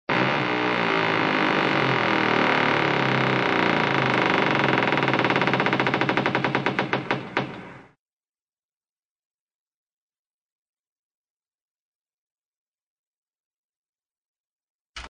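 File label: Exaggerated door creaks; Utrirovannye dvernye skripy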